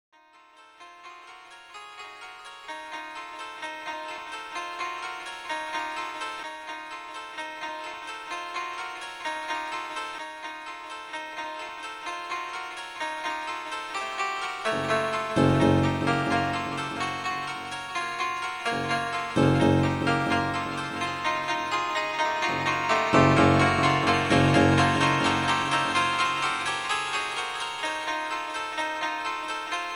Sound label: sony cd-r sampling okoto random melody